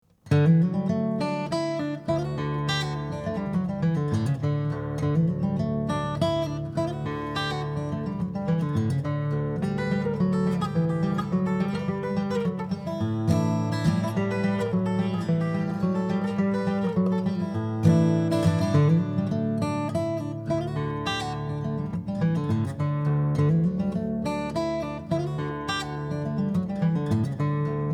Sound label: jig